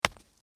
Hit Rock With Sledgehammer, Hitting Rock With Sledgehammer; Hit Rock With Sledgehammer , Low With Some Grass Movement